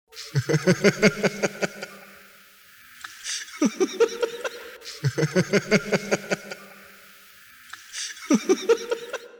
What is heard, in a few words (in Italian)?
Risata